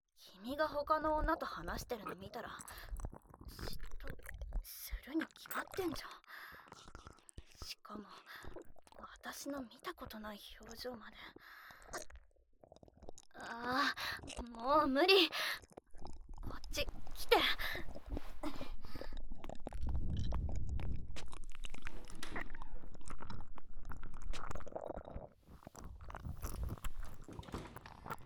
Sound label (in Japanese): 耳舐め 公園のトイレで ベロチュー フェラ パイずり 対面座位 キス 立ちバック 正常位 音声; 26分間耳舐めされながら、公園のトイレで相澤さんとベロチュー・フェラ・パイずり・対面座位し、部室でキスして立ちバック・正常位で中出しする音声